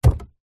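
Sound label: foley footstep single on wooden floor barefoot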